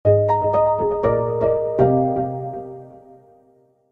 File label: jingle; ジングル[シンセ系](6); シンセディレイ系